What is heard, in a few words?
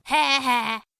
ha ha; bfdi; fireys